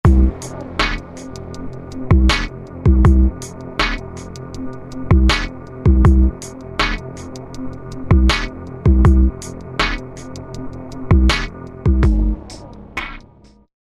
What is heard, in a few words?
LoopFX Dark; Roland SRX-05 Supreme Dance